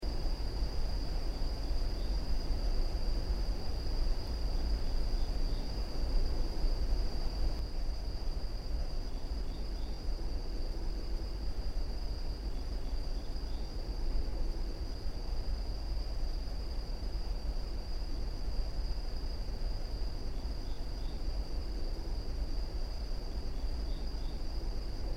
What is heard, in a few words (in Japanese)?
森の環境音